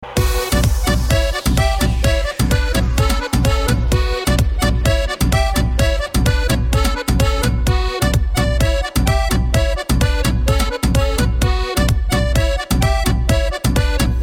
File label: Dance Club